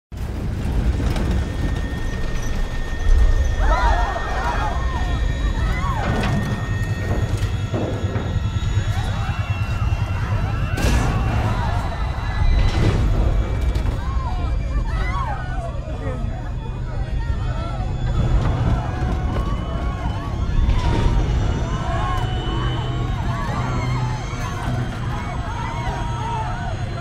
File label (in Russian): Крушение падение пассажирского самолета крики людей паника в салоне